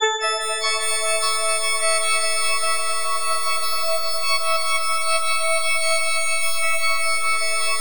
FM A MAJ; Bolder Sounds

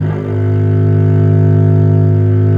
VL G#0, VIOLE, Historical Instruments; USB Soundscan vol.23

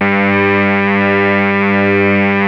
2600 A3, ARP 2600; Hollywood Edge - Giorgio Moroder Rare Synthesizer Collection

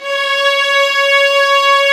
STR VAS, Amb f; Roland L-CD702; Vlas Sect3-4

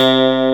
reso clav, swing synths; Zero-G - Funky Elements

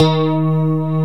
GTR, Clean E.Gtr, Ultra Sheen

